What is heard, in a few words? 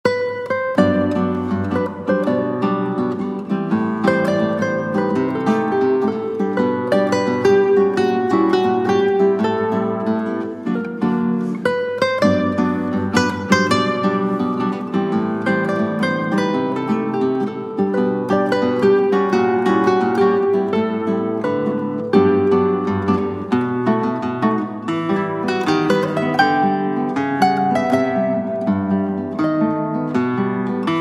bossa nova